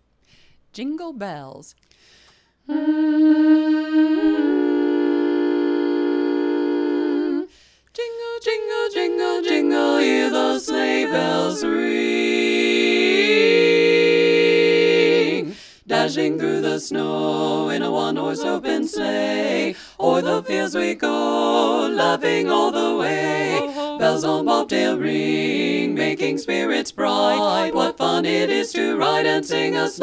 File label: Jingle Bells, 4-part; learning tracks